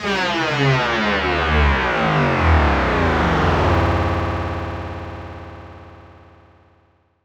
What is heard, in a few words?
2 bars robot riser